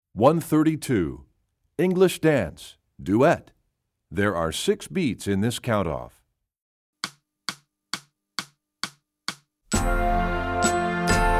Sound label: English Dance, Percussion; EE2000 Book2